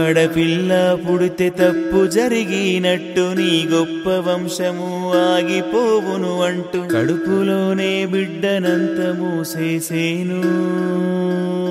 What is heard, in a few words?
emotional telugu ringtone